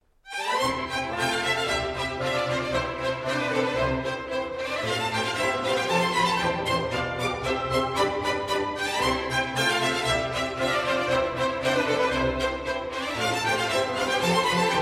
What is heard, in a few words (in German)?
Contretänze für orchester; Tanz VI